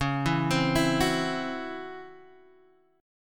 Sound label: Db Minor Major 7th Double Flat 5th Db down Guitar Half Step Down